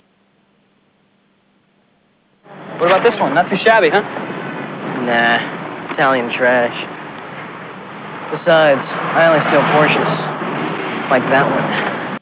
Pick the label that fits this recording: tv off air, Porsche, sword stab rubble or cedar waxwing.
Porsche